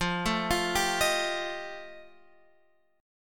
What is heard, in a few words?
F 9th F Guitar Open F, chord; arpeggiated